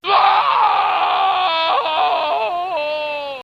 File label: death